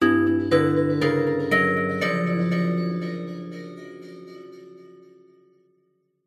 horror accesnt plucked weird mysterious ascending musical; zapsplat